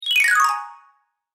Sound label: Cartoon Marimba Slide Descend Musical Chime; SFX Bible